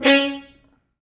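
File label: French Horns stops, C#3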